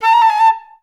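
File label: flutes A4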